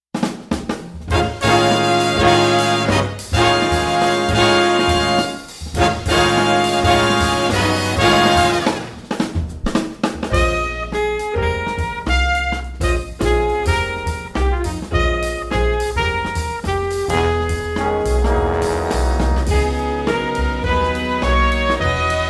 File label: Funk